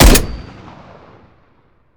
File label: hk417s; tacz; daffas arsenal